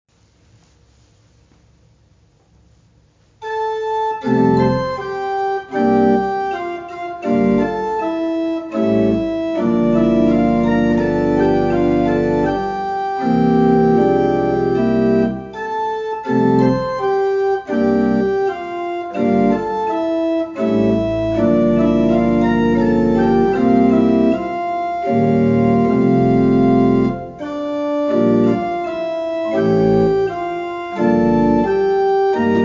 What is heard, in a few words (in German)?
Thema Orgel